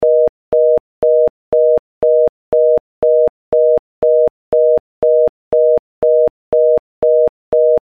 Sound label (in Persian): sari, بوق تلفن; انواع بوق تلفن و معنای آن